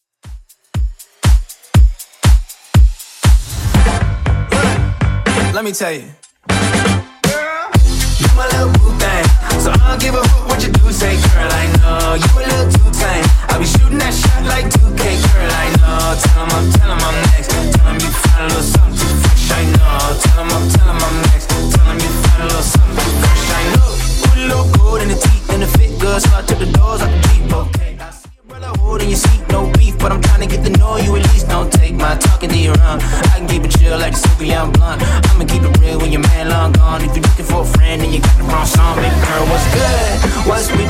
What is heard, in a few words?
Top40 Dance 120bpm; Redrum